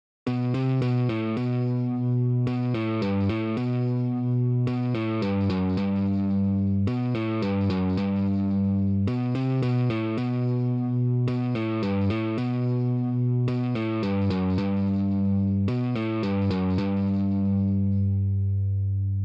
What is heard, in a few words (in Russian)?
vstuplenie vtoraya gitara